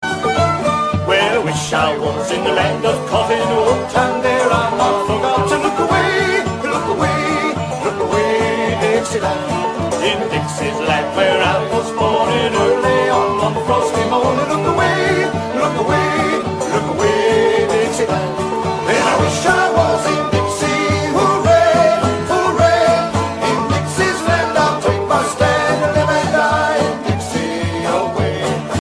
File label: Dixieland